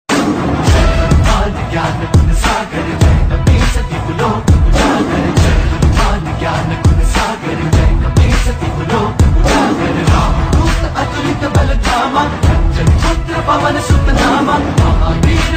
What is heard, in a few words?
hanuman chalisa ringtone; lord hanuman